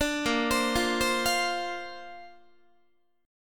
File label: Bb Major Add 9th, Bb, down, Guitar; arpeggiated; JGuitar